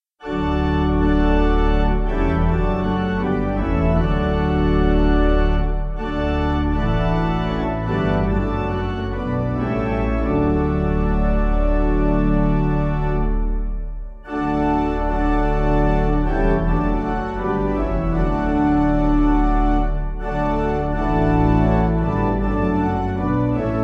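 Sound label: Pipe, Piano, Band, Pipe Organ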